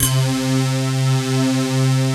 DYNATES, pads; Pro-Rec - Synthsonic

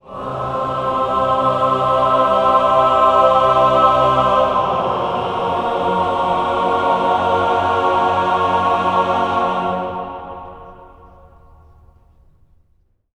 GLIDE CHD, voices

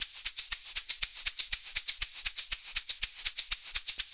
LOOP, SHAKERS, Percussion; InVision Interactive; Percussion Slam

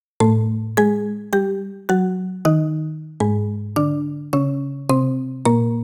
Marimbesque 80, 80bpm; Loops n Lines

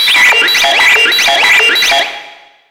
CARTOON, Hard & Loud Techno; USB Soundscan vol.01; AKAI

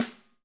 wood; Sampleheads; Peter Erskine Living Drums

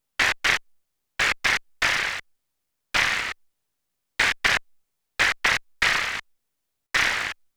Anabeat Noise; Sonic Foundry (Sony Creative Software); Prototechno

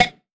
blip; Sonic Foundry (Sony Creative Software); Synchro Funk; One Shot Sonics

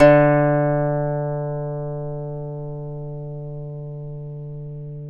D2 DULCIMER; AKAI S6000 CD-ROM; WorldSounds

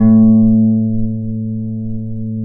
A1 HARP; USB Soundscan vol.62; AKAI

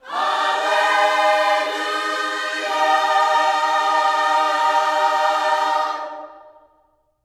HALLELU, Gospel; Spectrasonics; Vocal Planet; HALLELUJAH

